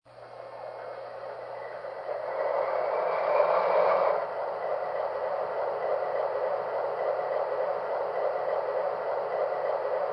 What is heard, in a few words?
DASH 8